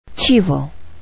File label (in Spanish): chivo; animals